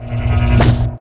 metabolize fire; weapons

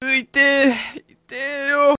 pain; svencoop

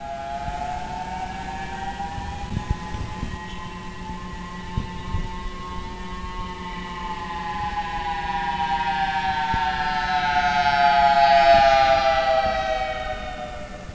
streets; firearms

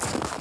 player snow; firearms

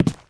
player tile

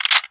clip in, weapons; brainbread

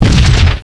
carpet bomb; gearbox; manta

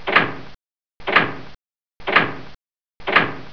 wood; brainbread; nighthouse

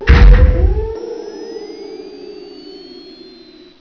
door stop; gearbox

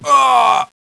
pain, player

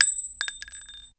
shell, weapons; brainbread

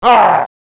flinch; gearbox; demoman

